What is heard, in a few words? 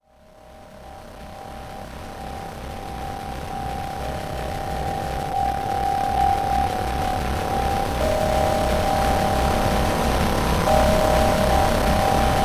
desolate drones, artificial atmosphere; toolbrain